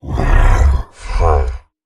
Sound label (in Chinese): 待机声; 劫掠兽